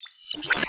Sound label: Display; Cosmic Rage; ships; computer